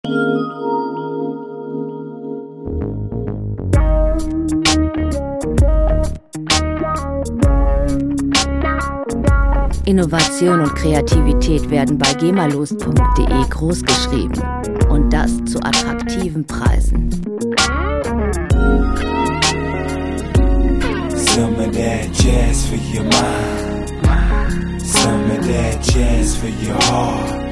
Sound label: jazz my soul